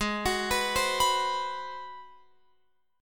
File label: G sharp 7th Sharp 9th, Guitar, Open F; arpeggiated; x,15,18,18,15,18; G#7#9